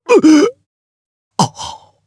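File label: Vox Dead Japanese; Phillop; kings raid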